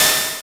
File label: FX ANALOG; Sound & Vision - Gigapack II